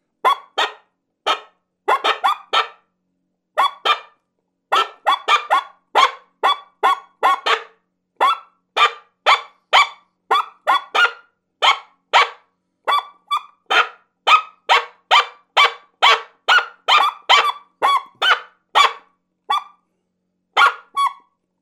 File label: Coyotes Barking; 2 Coyotes; Dave Kelso